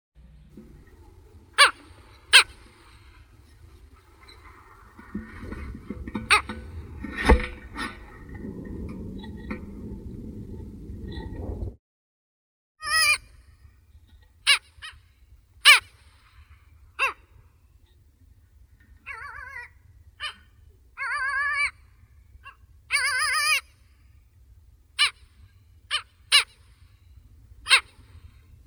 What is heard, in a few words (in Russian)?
pomornik srednii stercorarius pomarinus, zvuki prirodi